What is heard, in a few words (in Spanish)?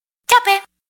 notificacion; Yape